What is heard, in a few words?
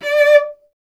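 strings cello D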